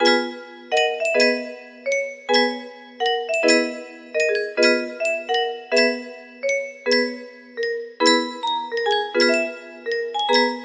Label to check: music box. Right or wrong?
Right